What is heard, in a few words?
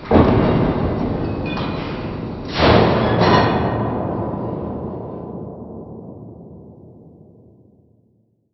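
industrial ambience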